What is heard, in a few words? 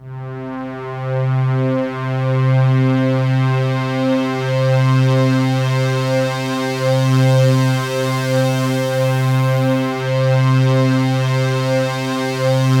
PAD6 C4; USB Soundscan vol.05 - Explosive Jungle; AKAI